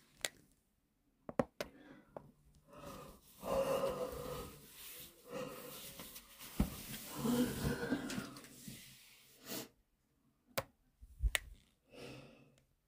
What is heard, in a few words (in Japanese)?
寝る前のオシッコ!